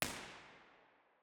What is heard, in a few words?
typing, room; terrys; ortf